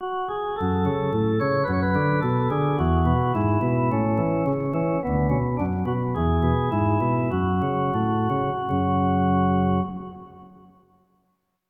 Play The Organ; Legend of Mana

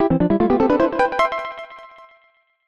Fanfare; Dragon Quest III

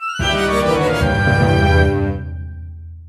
Player Down; Star Fox 64 3D

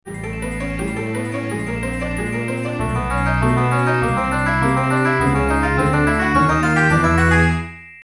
Open Treasure Box; The Legend of Zelda Ocarina of Time

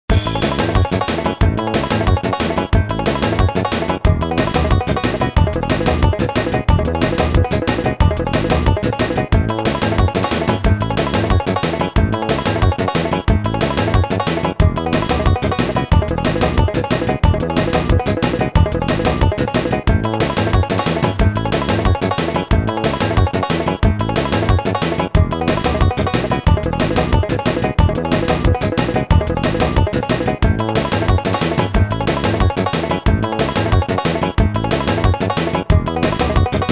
Player Select; Banjo

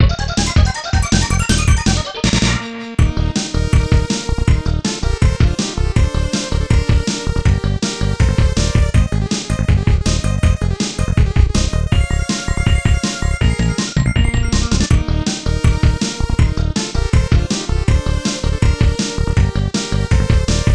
Course Clear Band; Star Fox

